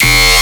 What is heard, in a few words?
Bass Hit E, Dubstep